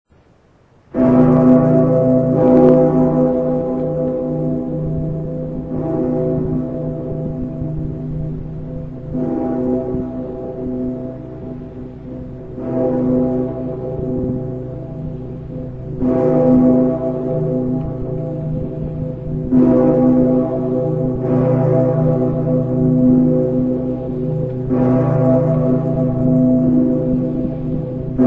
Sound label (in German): petersglocke, glockenschlag